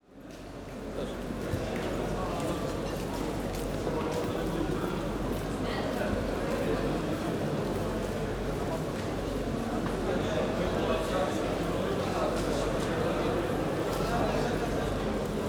Market big bazard indoor street voices and light activity; Turkey; Charlie Atanasyan; Faunethic